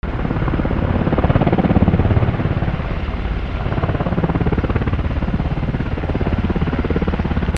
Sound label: flight